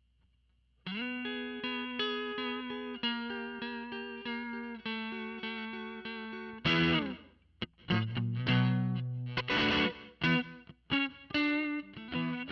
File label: kpa mesa crunch